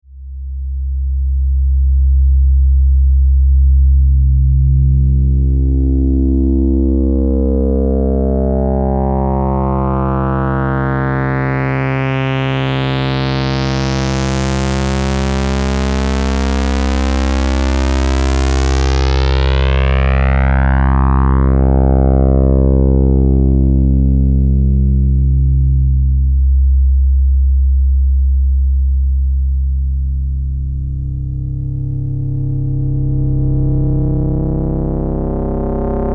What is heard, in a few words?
filter FM osc3; neptune II